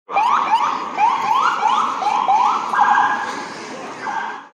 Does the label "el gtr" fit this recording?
No